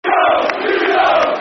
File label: Ugo, Football Chants (Soccer Songs); Rangers GRFC